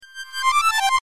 pickup; reverse

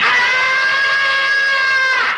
yell

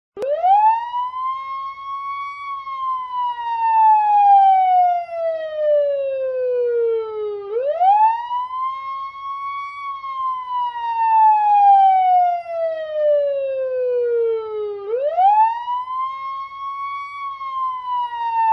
police siren

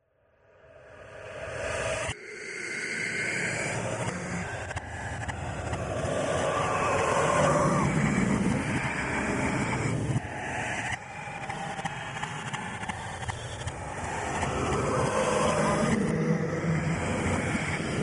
pool; reverse

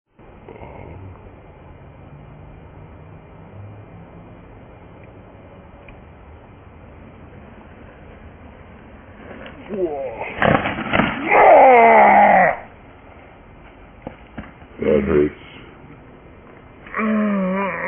fat jump; reversevape

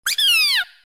toad joy G

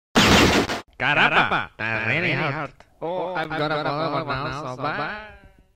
gun; cave

